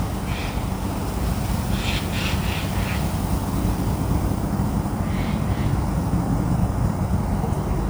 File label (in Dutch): Gaai Garulus glandarius; dieren; corvidae